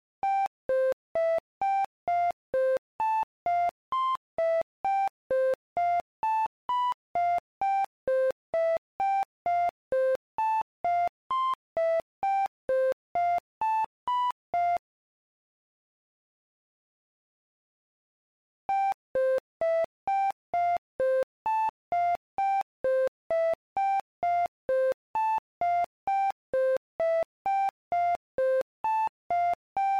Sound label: Dial Tone